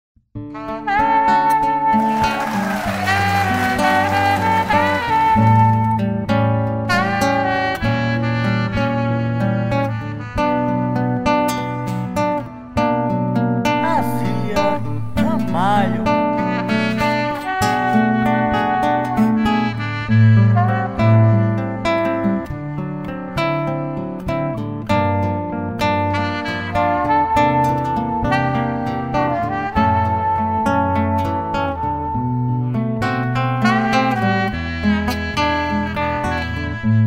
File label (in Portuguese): música solada sax